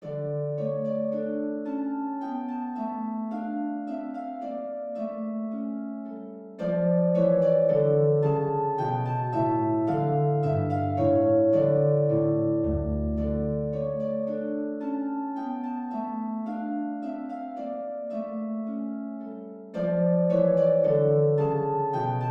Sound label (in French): air mineur; purcell henry